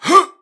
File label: Swing; doctor